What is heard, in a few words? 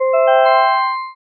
start